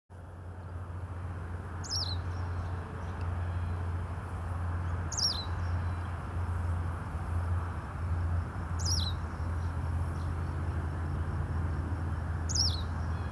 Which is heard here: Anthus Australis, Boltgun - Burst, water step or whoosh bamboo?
Anthus Australis